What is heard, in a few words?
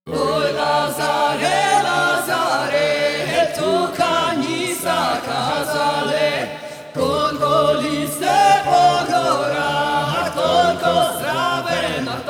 Combat Loop Layer; The Witcher 3 Wild Hunt